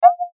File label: Beep Tone Signal Multimedia; Web Interactive